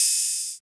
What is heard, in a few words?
Open Hihat, Cymbals; Vengeance Samples